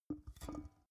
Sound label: big can empty put down rattle